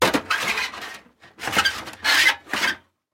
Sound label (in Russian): skripuchee peredvizhenie metallicheskoj stremyanki